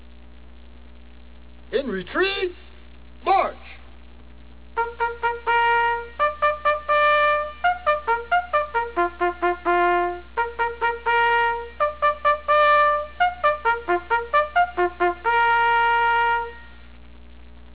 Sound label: retreat, bugle sounds